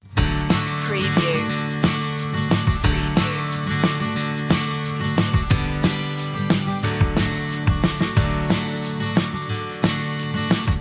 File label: indie feelgood; folk-rock